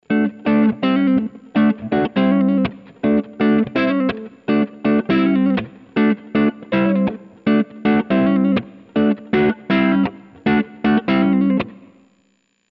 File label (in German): Neck PU leichter Boost; George Benson